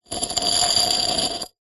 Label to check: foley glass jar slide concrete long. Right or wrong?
Right